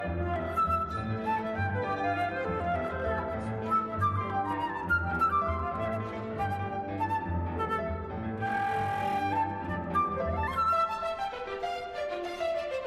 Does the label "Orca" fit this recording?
No